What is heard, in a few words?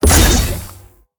Monster; Zenless Zone Zero